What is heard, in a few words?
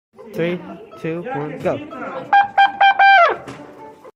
dumb trumpet